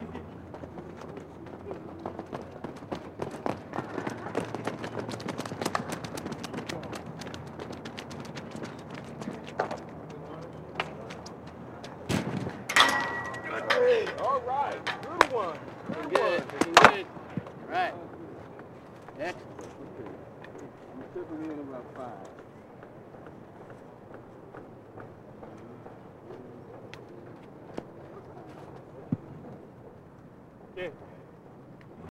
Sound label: Track Field Run High Jump, Track and Field Running, High Jump; Pole Vault